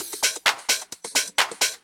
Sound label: Dance Grooves; Best Service; Dance Mega Add On Grooves